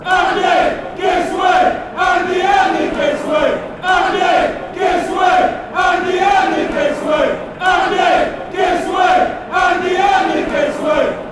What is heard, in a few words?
give us wave, fan chants; andy